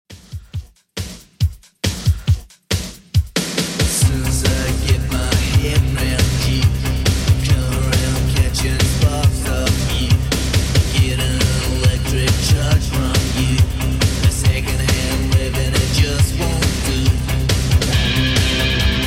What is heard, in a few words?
80s Redrum 138 bpm CLEAN